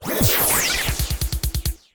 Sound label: Radio Station ID